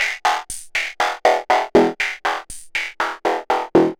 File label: 120 MOD GUIT E, Modular Synth Loops; Polyend; Simulacrum Sounds